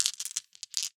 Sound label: Marbles Rattle